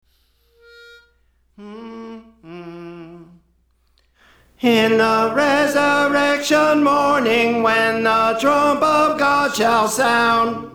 Tenor; Praise For The Lord Training

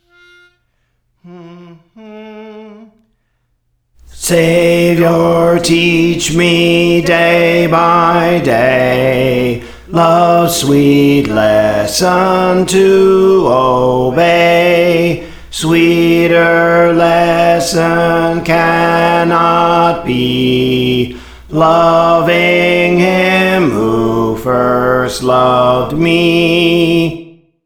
Bass, Praise For The Lord Training

